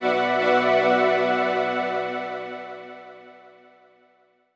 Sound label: FX Orchestra B Min; FX Collection; Hip Hop